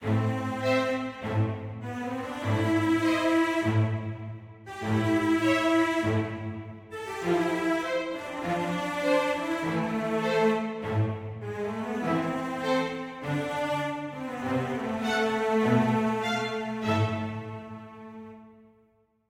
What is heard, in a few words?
mysterious forte; Quivr; PS4 Windows gamerip 2016